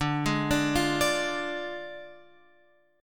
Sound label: D Diminished Guitar Open F, chord; Ddim arpeggiated